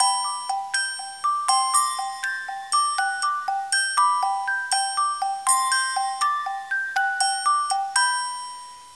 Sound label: Intro Splash Song; Fisher Price Ready for School Kindergarten